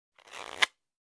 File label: Play Cards